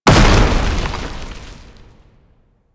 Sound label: Containment Protocol Impact; Warframe; Warframes; Qorvex